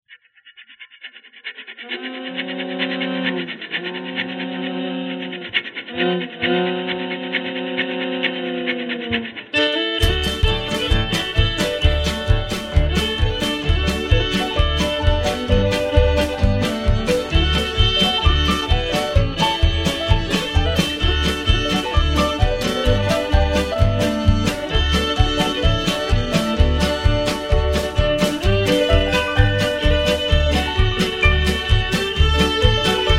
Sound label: music sample